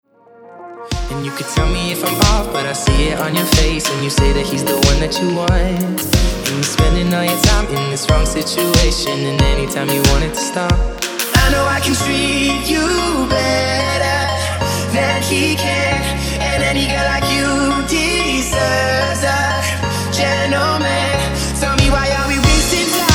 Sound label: EDM